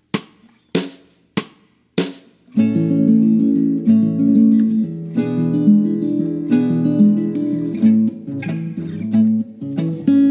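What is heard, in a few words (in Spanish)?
2 guitarras con click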